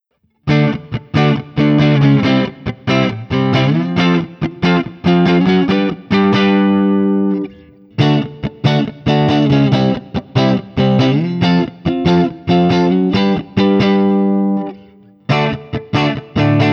Guild X92 Citron, A Barre Chords; Guild X92 Citron Breakaway from the depths of 1984; JCM-800

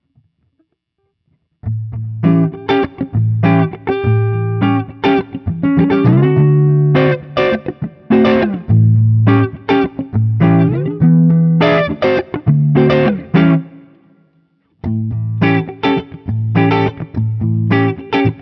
Guild X700 ODS100

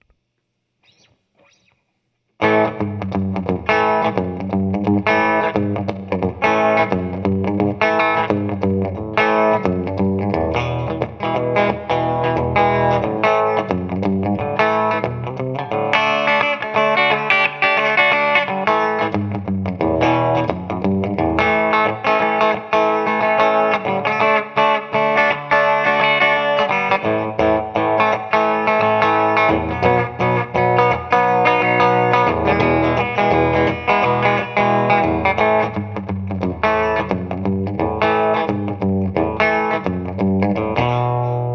Bridge Pickup, Guitar; Starfire4

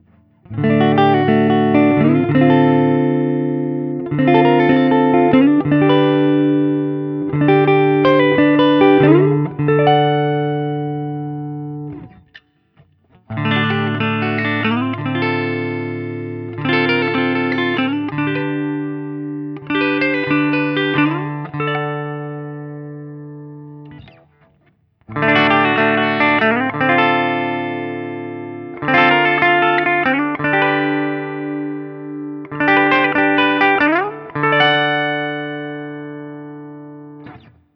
Guild Starfire III, ODS-100, D-shape; feedback; 1966 Guild Starfire III

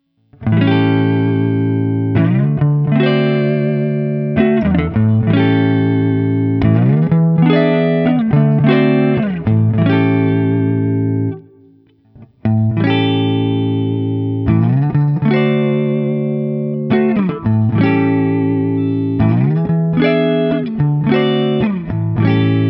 X170T, A7 Barre Chord; Guild; Guild X170T Mars from 1999

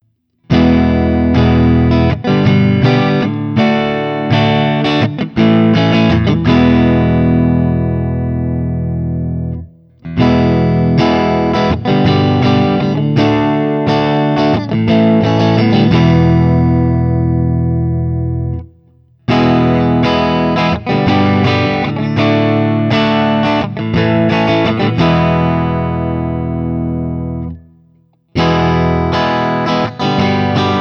Guild X92 Citron, Clean, Open Chords; Guild X92 Citron Breakaway from the depths of 1984; ODS100 Clean; single-coil

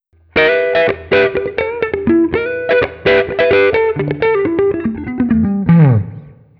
Guild X700 RC120 12th fret noodling

